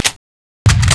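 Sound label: double barrel reload, weapons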